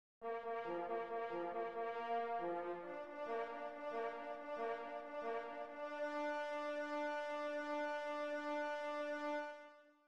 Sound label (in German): Halali